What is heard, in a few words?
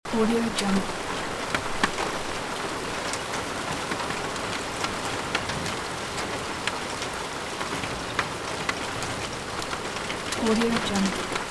thunderstorm with rain and light hail